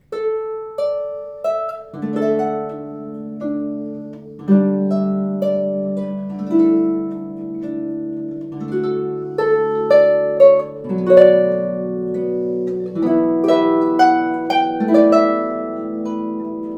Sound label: Water Is Wide, Harp